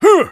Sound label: taunt exert